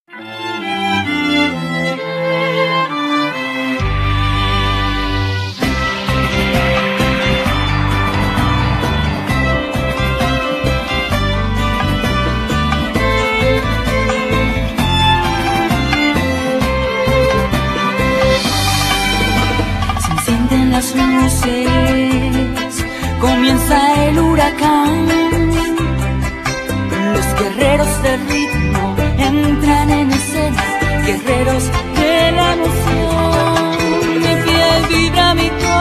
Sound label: latin groove bachata